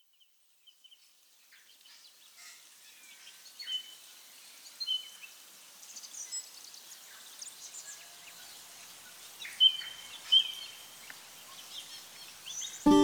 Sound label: keyboards